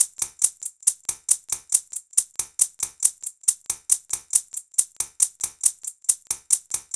Shakers 138, Percussion Elements, Abstract World Fusion